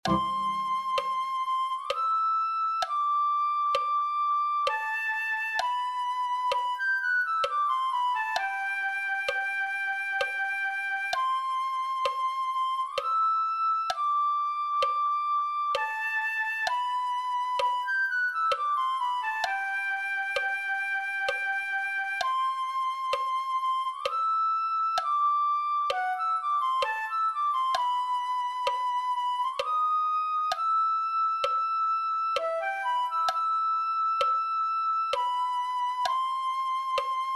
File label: flute